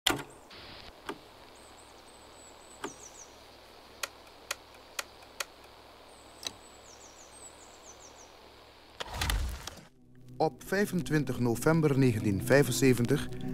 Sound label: Jazz Funk from Suriname and its Diaspora